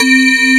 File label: METAL BAZZ